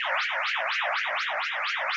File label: 121 sci-fi oscillation; SYNTH AND ELECTRONIC LOOPS